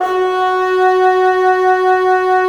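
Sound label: wind; LA Composer vol. 3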